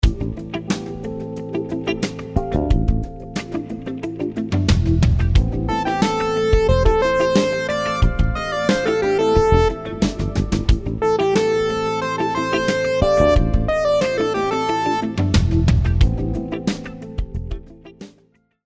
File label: rhythms 16s syncopated